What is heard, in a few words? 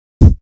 Beatbox; 90 sSampleCDs; Coldcut's Kleptomania VOL1